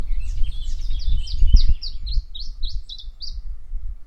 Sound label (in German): Iberienzilpzalp